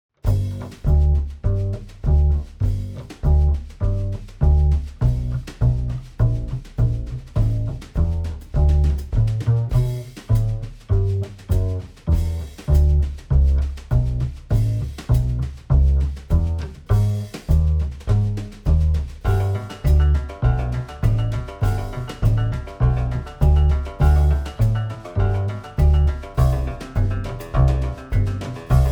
Swing Des Puces Underscore, Jazz Manouche

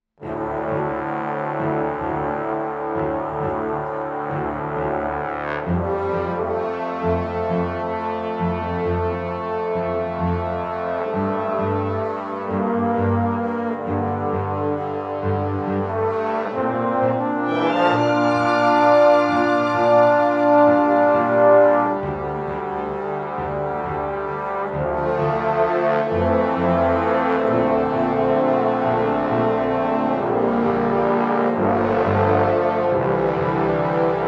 Underscore, Classical Cinematic; Narrative Mind

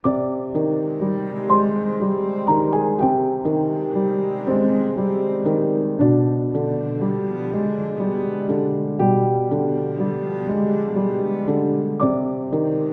The Third Mirror, Classical Cinematic, Moody

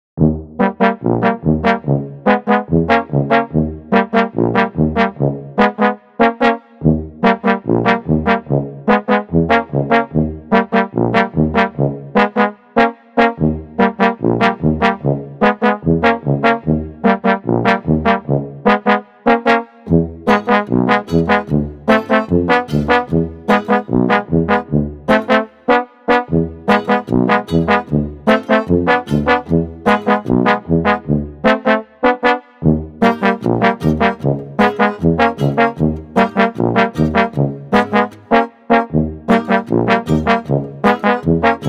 Underscore, Balkan Brass; Narrative Mind

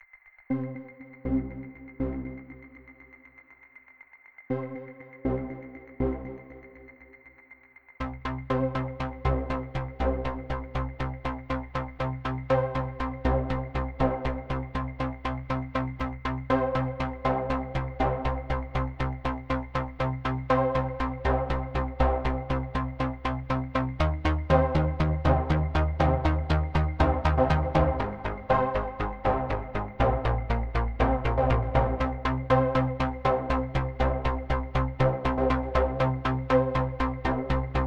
Underscore Pulsing Motion; Narrative Mind

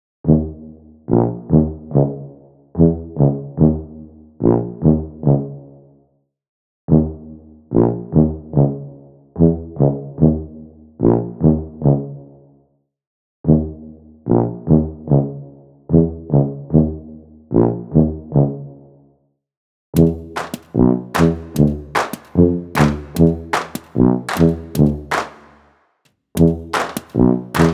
Underscore, Balkan Brass; Narrative Mind

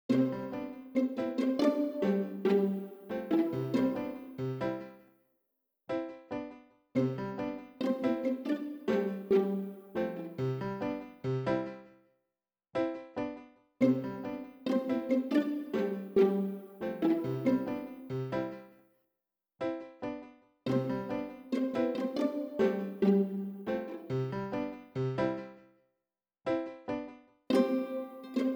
quirky underscore

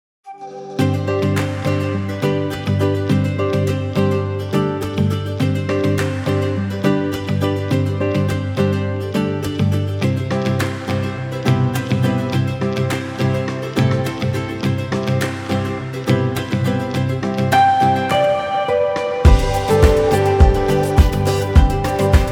Return Of Summer, Summer Daze, happy; Narrative Mind

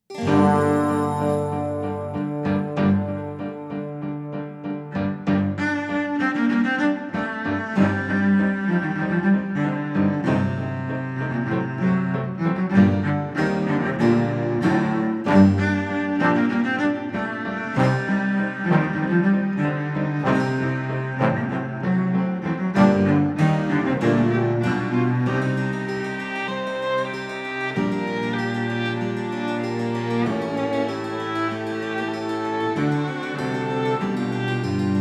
Classical Cinematic; Narrative Mind

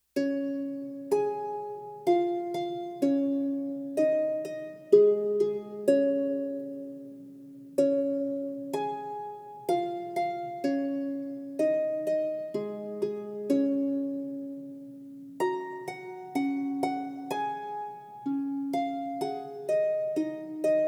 Bah Bah Black Sheep Harp Version; Tiny Tunes; Narrative Mind

